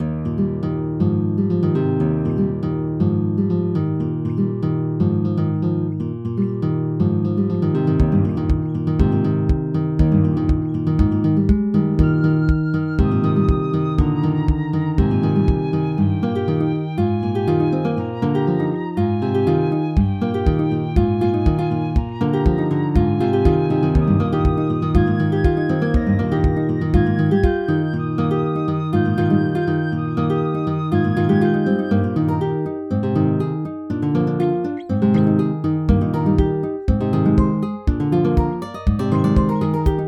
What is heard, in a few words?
guitar